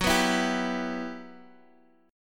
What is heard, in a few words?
E Minor F sharp, chord; Em/F# Chord {X X 4 4 5 3} strummed